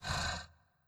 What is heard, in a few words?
Bull